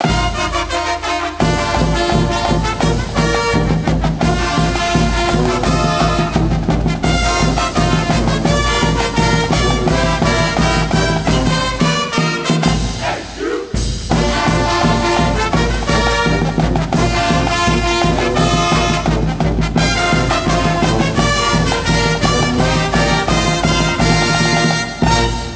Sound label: Arcade Music; NCAA March Madness 2001; PS1